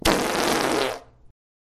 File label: Funny Fart Trail